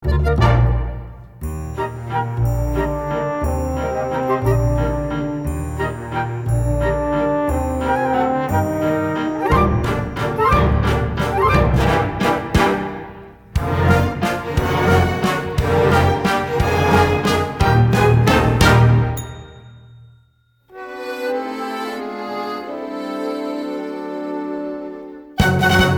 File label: accomp